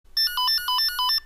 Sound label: Ring